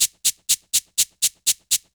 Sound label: Electricity Cabasa 123, percussion elements